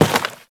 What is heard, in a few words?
Worker Step Default; Warframe; Solaris